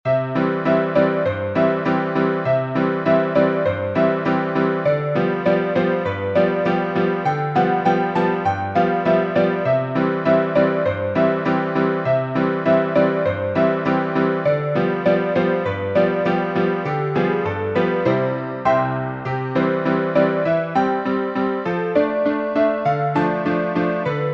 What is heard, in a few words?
piano, sheet music